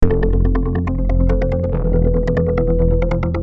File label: Synth Poly